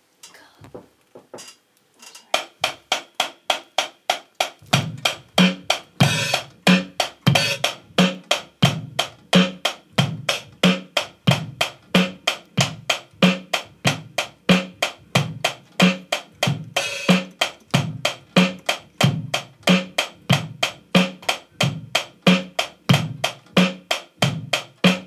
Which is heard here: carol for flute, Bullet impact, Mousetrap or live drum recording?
live drum recording